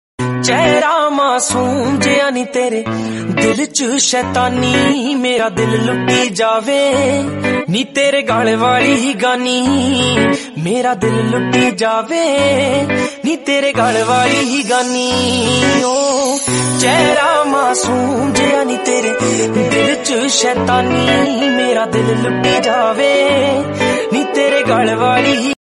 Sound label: Punjabi ringtone tune